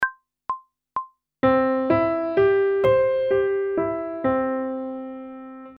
Major Arpeggio one octave ascending and descending; pop vocals; grade 6; Technical Work